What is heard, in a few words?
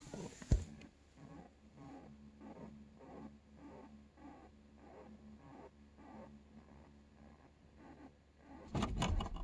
audio troubleshooting android hu hiss